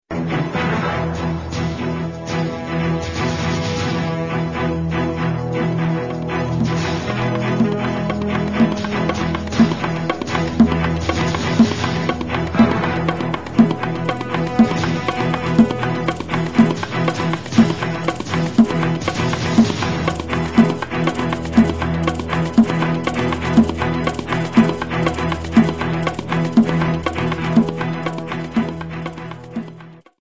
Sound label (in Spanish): tension bongo maraquita